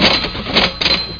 CASHREG

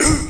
Aouch; Captain Bumper 0.58

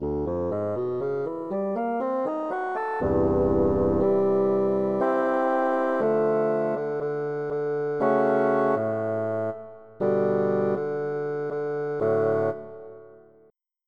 Bassoon

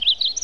parrot asterisk

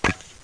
flash sound; In Focus Guide to Better Photography

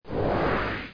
Exclamation

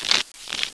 SHUFFLE; Pretty Good Solitaire 7.20

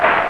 Fireball; Battle for the Universe

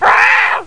high growl; joleen

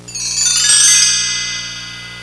BELL TRE, PERC

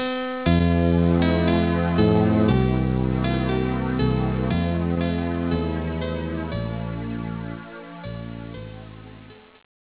Christmas Church; Christian Desktops for Win98

